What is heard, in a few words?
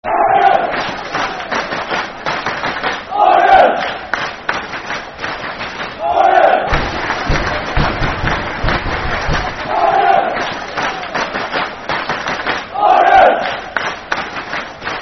Orient (Clap) Football Chant (Soccer Song); Leyton Orient Lofc; fanchants